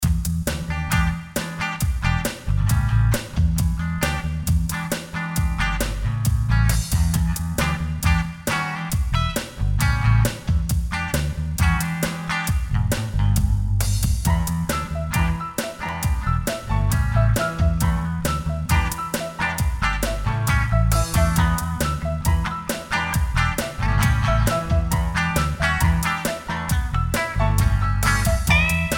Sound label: blues study e